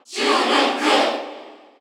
Cheer Korean; Shulk; SSBU

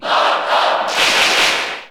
Cheer Italian; Morton; SSB4; SSBU

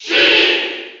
Cheer; Sheik; SSB4

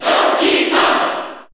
Cheer Japanese; Donkey Kong; SSB4; SSBU

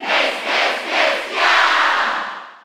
Cheer German; Ness; SSB4

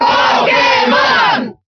Cheer Italian; Pokémon Trainer; SSBB

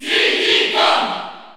Cheer French; Diddy Kong; SSBU

